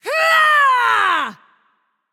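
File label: battlecry, female voice; smite; voicepack; hera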